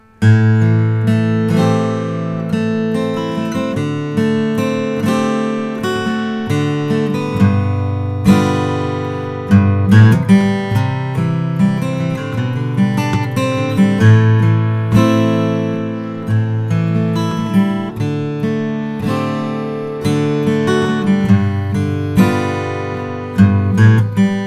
martin custom 000 42 koa 12 fret

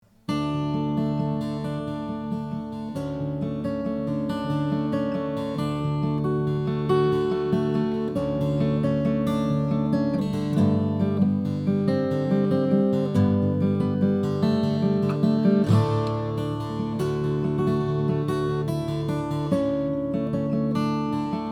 olson sj indian rosewood

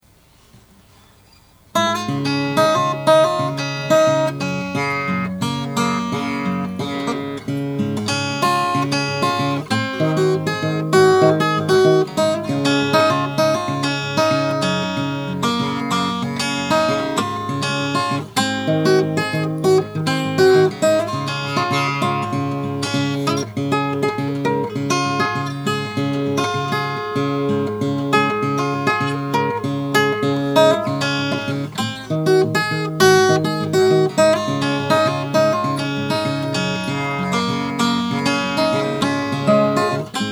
gibson l 0 mahogany; 1929

